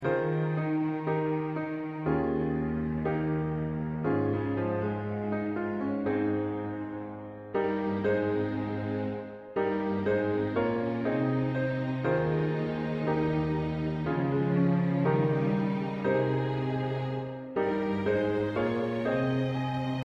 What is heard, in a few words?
Accompaniment